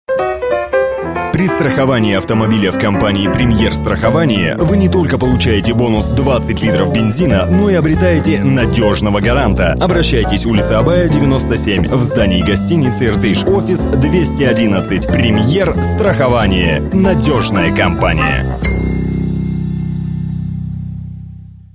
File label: jazz rus